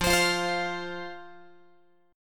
F 5th F, down, Guitar; F5 strummed

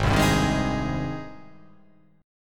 G sharp Major 7th G sharp

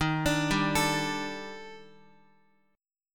D sharp Major Sharp 11th, Guitar; D#M#11; arpeggiated

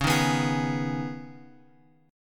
C sharp 7th Suspended 2nd, C sharp; C#7sus2 Chord {X 4 1 4 X 4} strummed

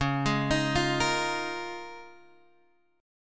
C sharp 6th Add 9th, Guitar, Open G; down; chord shape

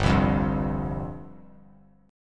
G 7th Suspended 4th G down; G7sus4 strummed

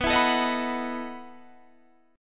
E Major 7th Suspended 2nd Suspended 4th

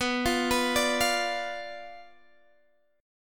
B Diminished B Guitar Open D

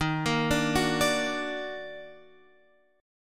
D sharp Minor Major 7th, Guitar Open F; arpeggiated

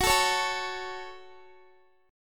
F sharp Minor, Guitar; F#m strummed; x,21,19,18,21,x

